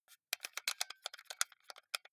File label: console controller xbox play sequence lively short